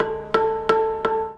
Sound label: ZIMBAL DAMP; Best Service; World Colours